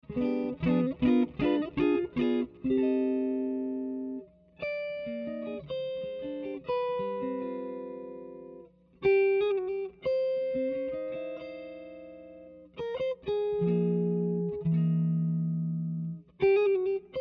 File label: jazz, amps